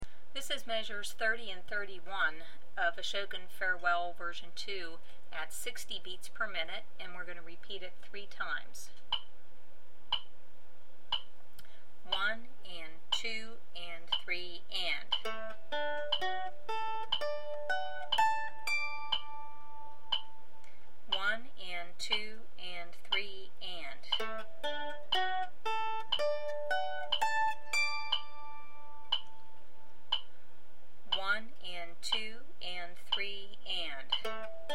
Arpeggio 60bpm, mandolin

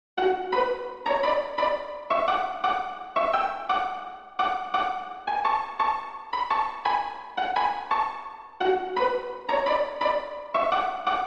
Duet 85bpm, mandolin

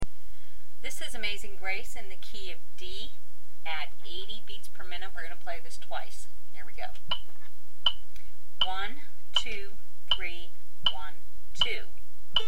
Key Of D 80bpm, mandolin

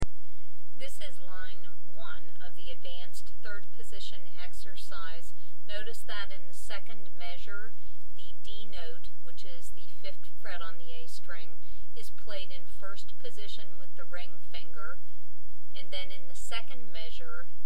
Advanced 3rd Position Exercise, mandolin

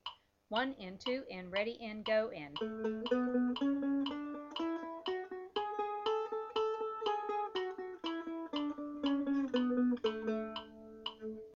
A Scale Eighth Notes 120bpm, mandolin

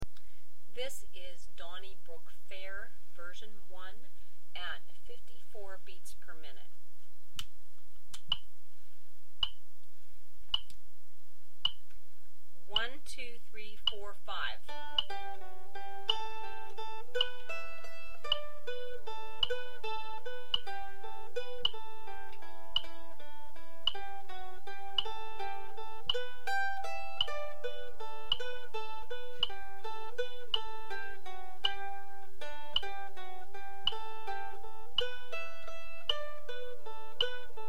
Donnybrook Fair 54bpm, mandolin

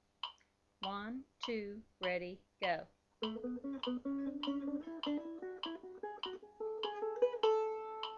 Triplets In A 100bpm, mandolin

